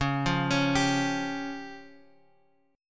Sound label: Db Major Flat 5th, Guitar Open F; arpeggiated; chord shape